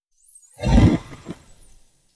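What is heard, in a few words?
underwater blow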